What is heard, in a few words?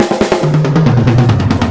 Loops Fills; Vengeance Samples